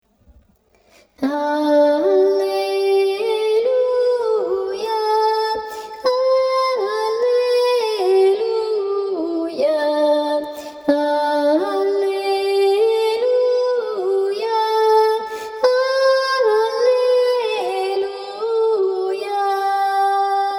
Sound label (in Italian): alleluia irlandese soprani